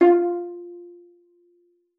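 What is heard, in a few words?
violin pizzicato F5; Xsample VOL-5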